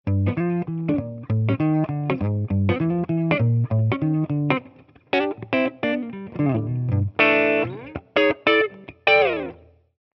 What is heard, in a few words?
Les Paul jazz; Tone Monster